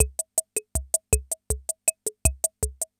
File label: CR78; Best Service; XX Large Most Wanted